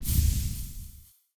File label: shiny item pickup